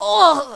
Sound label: pain, player female